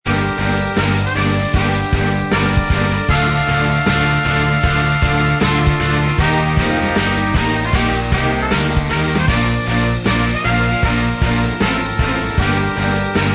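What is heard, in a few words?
Inst Track, instrumental track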